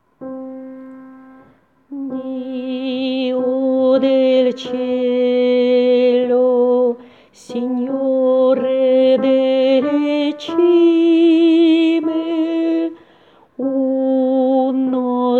Signore delle cime tenor

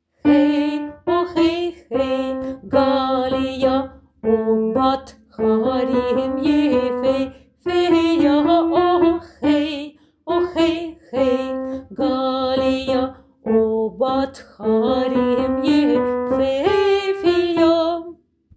Simi jadech Alto mesure

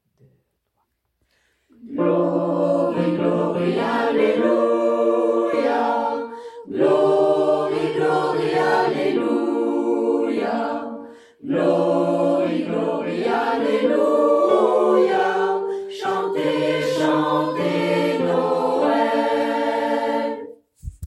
Glory alleluia chorale; Arthes

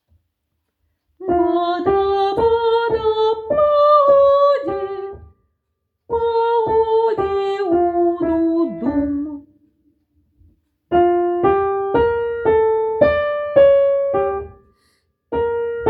Sarabande Alto

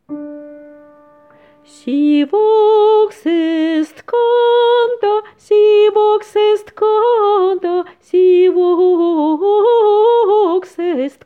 Si vox est canta hommes

